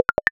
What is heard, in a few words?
notification pops